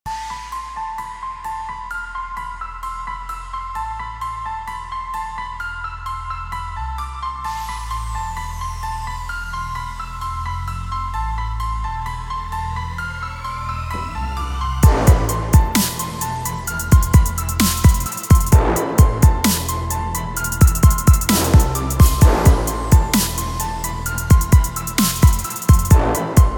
Experimental Sport Hip Hop

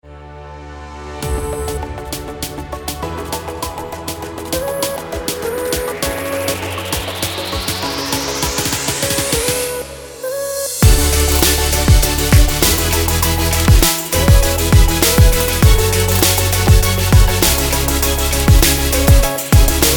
Future Beat

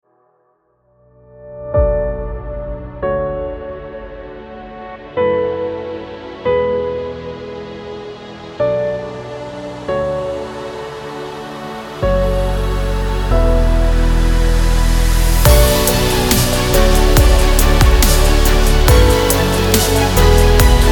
abstract cinematic

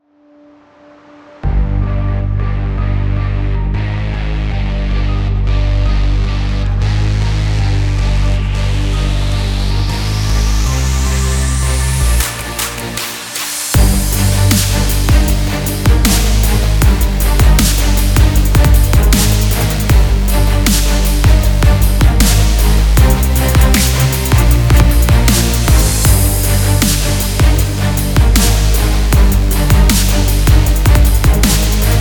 Aggressive

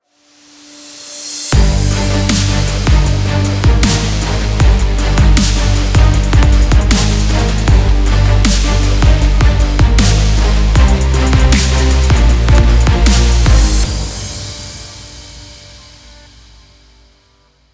Aggressive

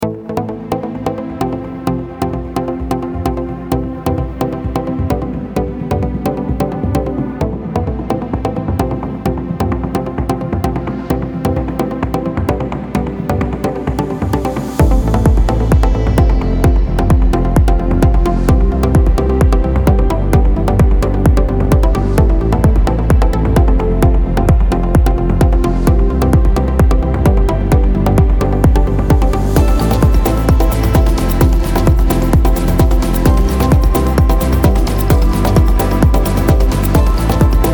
Dance Groove

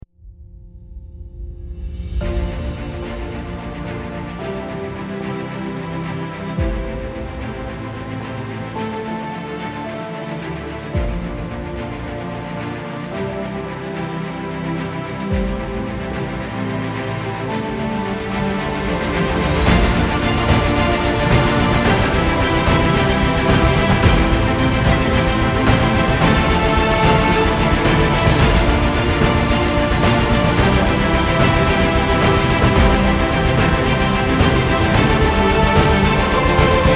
Inspiring Trailer

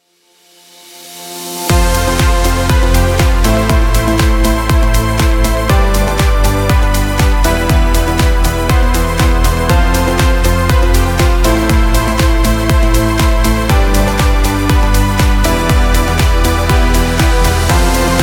Promo

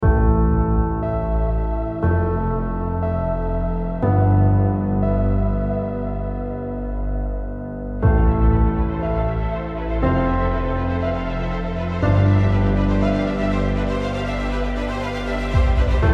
Cinematic and Epic Opener

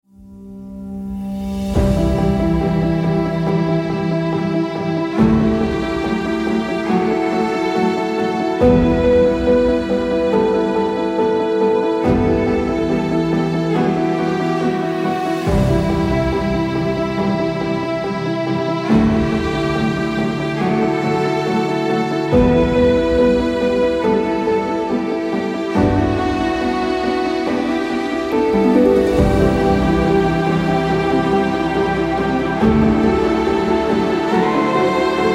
Emotional Piano

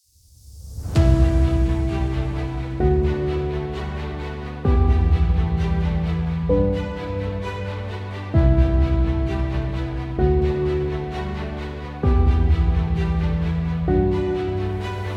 Epic Inspiration

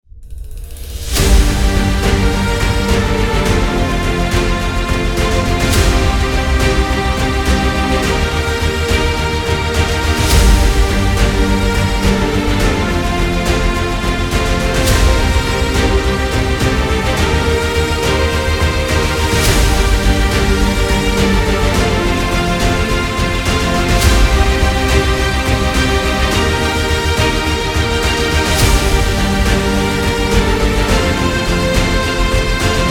Victory

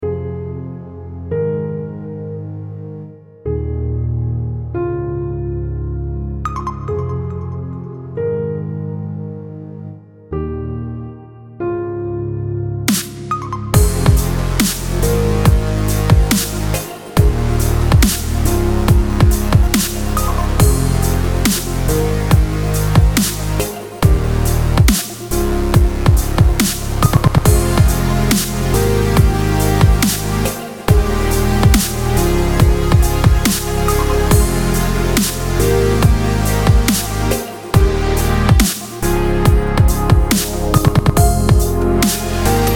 Inspiring Dubstep Long

